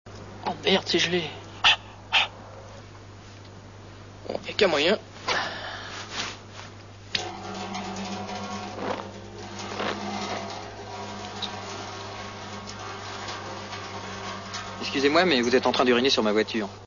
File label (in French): Uriner Voiture